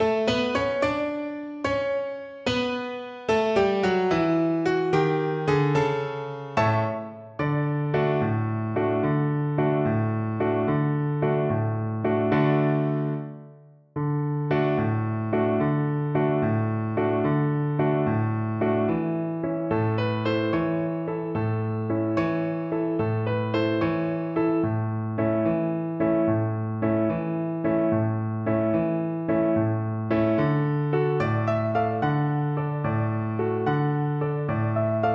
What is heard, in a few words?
luna mezzo mare, Viola